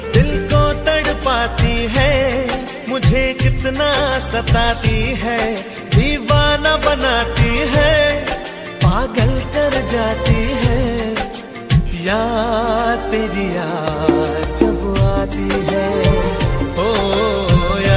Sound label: hindi music ringtone, bollywood